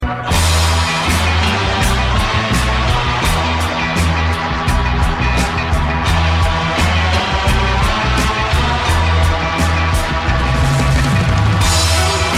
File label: Rock